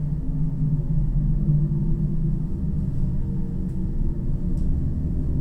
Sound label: elevator loop